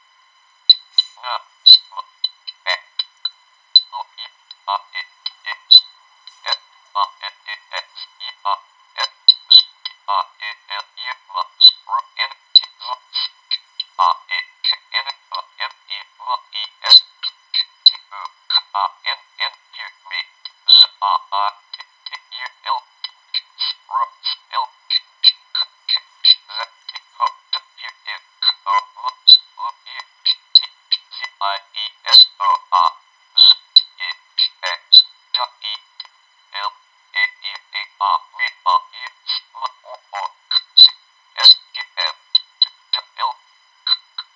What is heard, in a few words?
test 30-60hz echo high pass filter